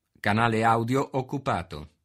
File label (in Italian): canale occupato; dtmf